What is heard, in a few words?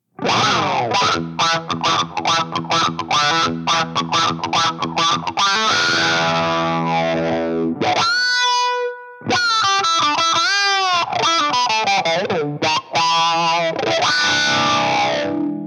drive sense